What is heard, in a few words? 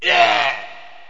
drown; alienarena; construct